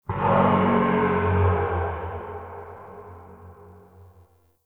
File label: Swamp Low Moan; Ambience; Midrath Island